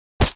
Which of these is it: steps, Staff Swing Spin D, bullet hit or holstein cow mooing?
bullet hit